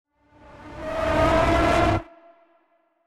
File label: Wave Burst; VALENTINE